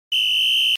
Ref Whistle

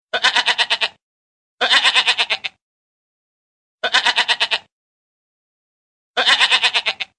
Goat Funny

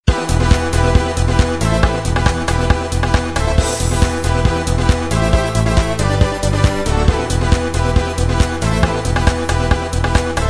Polyphonic

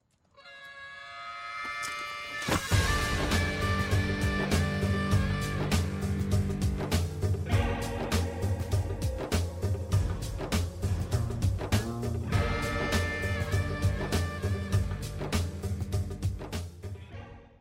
Cowboy Theme

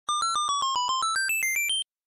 extended 1up; mario3

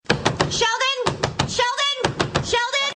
Knock Knock; Penny

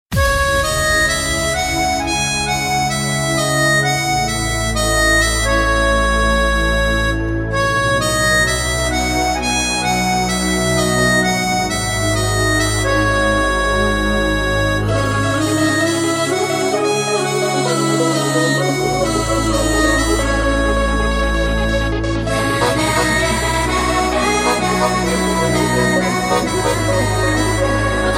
New Punjabi Music

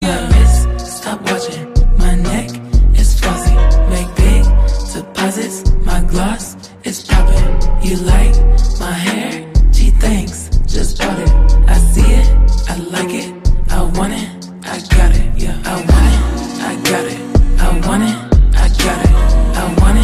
slowed

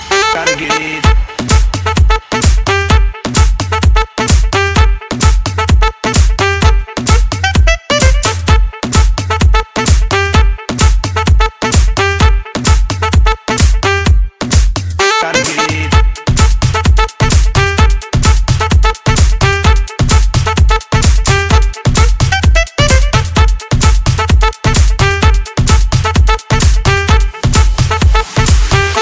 Nice Saxo

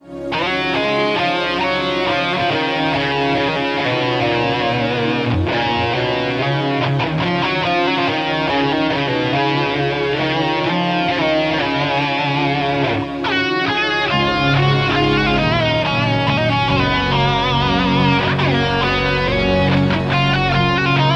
Bangladesh Anthem

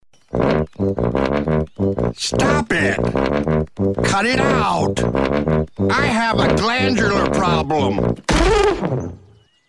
Fat Guy Walking

